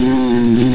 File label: buzz buzz; Buzz Buzz's Buzz